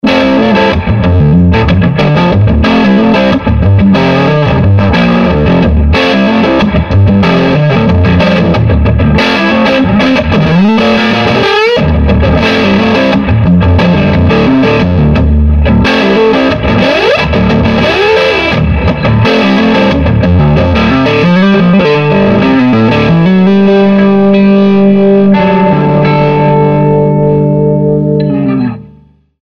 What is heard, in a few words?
SG neck pu crunch; swart ast pro